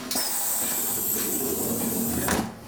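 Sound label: Door Close, Train; OpenBVE; Bzmot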